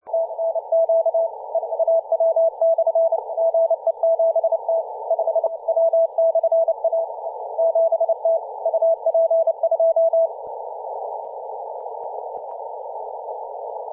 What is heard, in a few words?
cw cqing, 3.507mhz